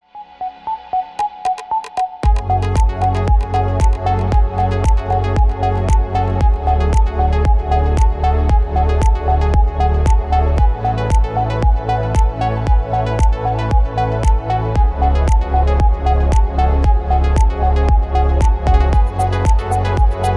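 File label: rhythmic alarm clock melody; Ритмичная мелодия на будильник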